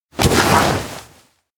stumble fall; anemoi

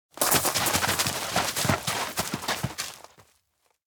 footsteps park run mixed; anemoi

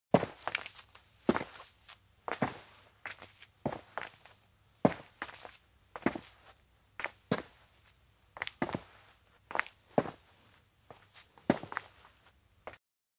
step walk; anemoi

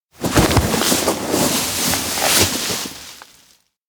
drag slope protect; koyomi; anemoi

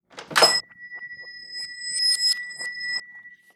reverse cafe; anemoi

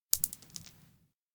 konpeito drop; anemoi

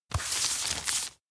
map point arc; awayuki; anemoi パッケージ版

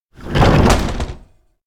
door janitor room open fast; anemoi

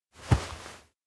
body close; awayuki

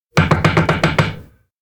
hammer fast; chiharu; anemoi パッケージ版 1.1

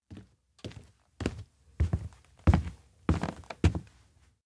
steps indoor abandoned cafe walk approach; anemoi

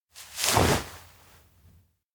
turn back; anemoi パッケージ版

